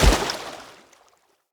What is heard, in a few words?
Step Water, animals, foley, steps; Bromius